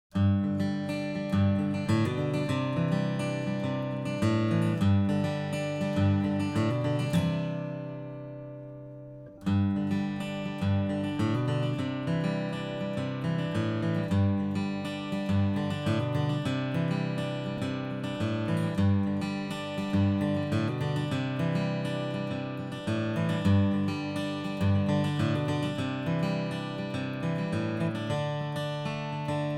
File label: D35 picking